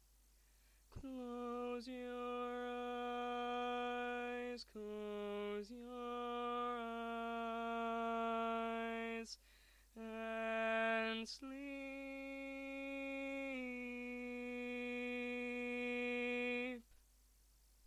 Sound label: 5 parts Lead, barbershop tags